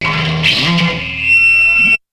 GTR FDBK; BEAT2000

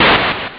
Level In; Classic800XLPro

